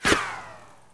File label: bullet fly by